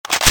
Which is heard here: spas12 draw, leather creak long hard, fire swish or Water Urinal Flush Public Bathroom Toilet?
spas12 draw